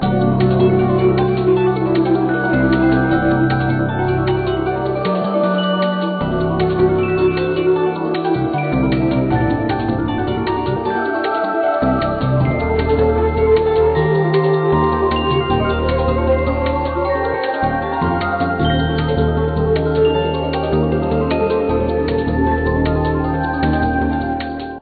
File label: mystery Bonus Loop; crystal Gems; Core Gaming